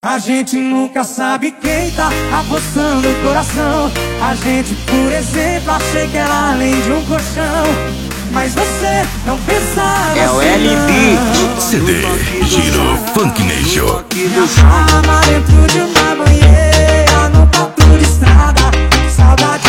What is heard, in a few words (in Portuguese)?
FunkNejo